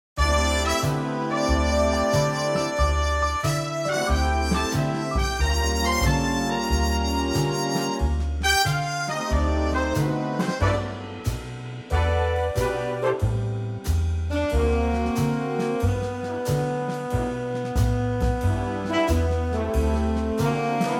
Big Band G; key - G - vocal range - F# to B; Wonderful mellow big band arrangement of this classic standard

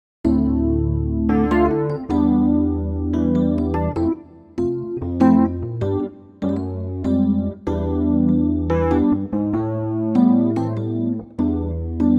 C Rhodes

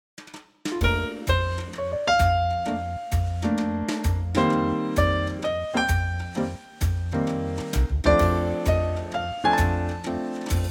trio Bb swing

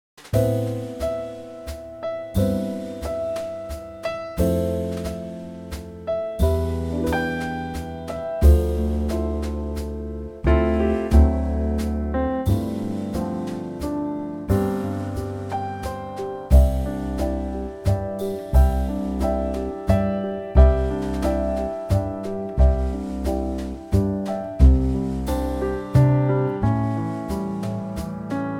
C Trio Ballroom

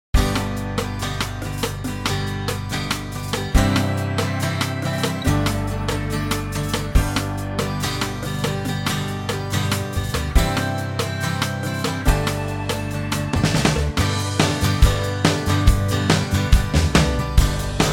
G No Electric Gtr

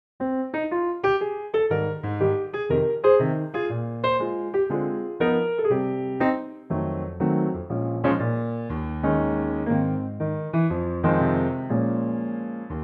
Ab piano

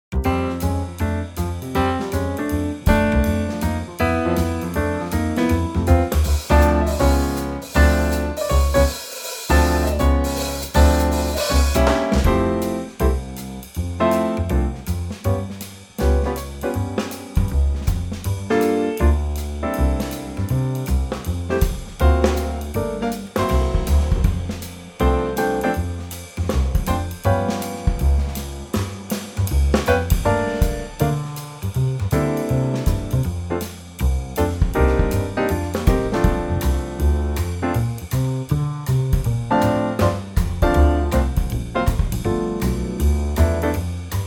F Trio

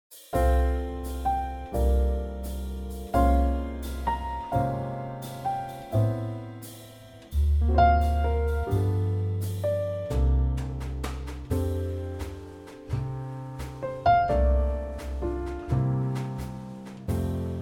F trio

